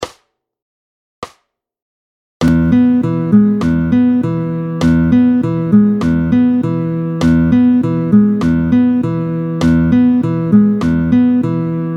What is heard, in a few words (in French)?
binaire 100, picking